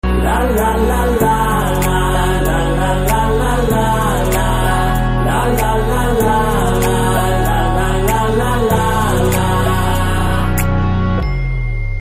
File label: BGM; AVP